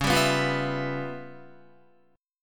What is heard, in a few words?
Db 7th Sharp 9th Flat 5th Db down Guitar; Db7#9b5 strummed; JGuitar